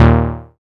SYN SUNCHORD, dance synth; Sound & Vision - Gigapack I CD 2

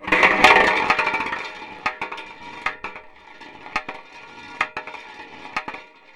Tin Bucket, contact mic, Metalics

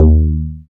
BAS FM DX-7

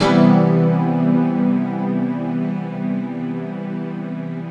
EP, Keyboards, Electric Pianos

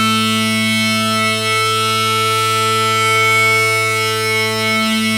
BAGPIPE; E-MU Formula 4000 Series Vol. 4; Earth Tones

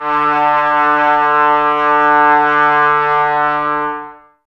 brass, Tbs Mute; Miroslav Vitous - Woodwind Brass Ensemble

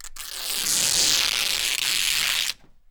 rip, Canvas Bag Rips

